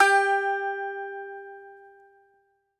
BAGLAMA, Plucked Strings

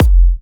F, kicks; markus hakala kicks

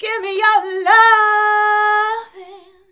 VOX, Singin

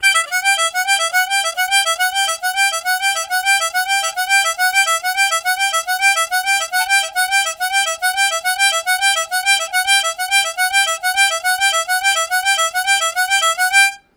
Pattern, Harmonica Riffs; Zero G

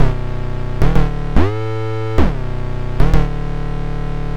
Bass Line; Eclectic Electronica

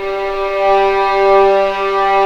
G3 LEG P, VIOLINS; East West; The Ultimate String Collection

